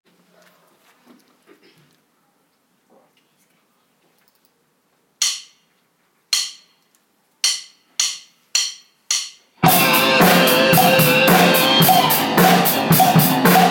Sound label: drum solo